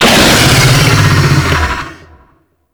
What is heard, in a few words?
Infested Bone Blade Vocal E; Warframe